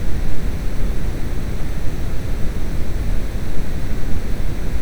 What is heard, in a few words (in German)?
Rauschen